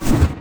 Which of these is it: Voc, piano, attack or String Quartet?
attack